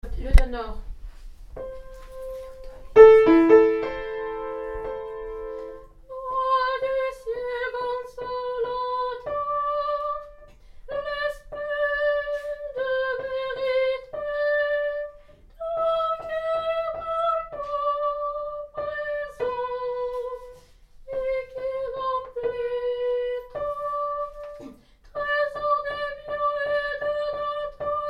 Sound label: tenor; Roi celeste